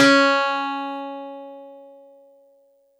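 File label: Easy Clav DX100 C#3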